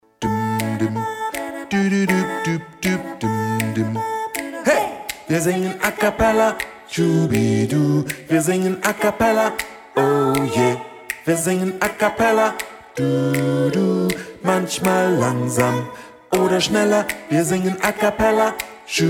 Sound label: Acappella - Bass